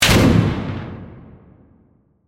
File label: Hit Slam Reverb; Sharp, Production Element, Imaging Element, Accent, Transition; BlastWave